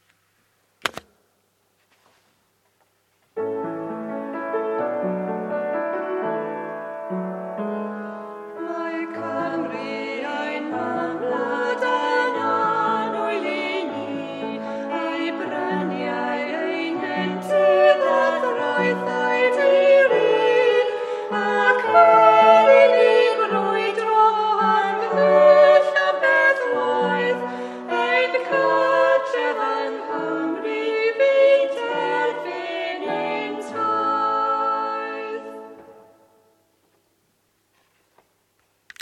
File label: duet verse 1